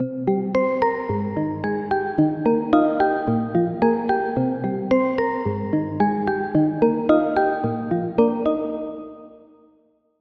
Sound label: Bell